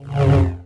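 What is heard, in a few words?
sith lightsaber Swing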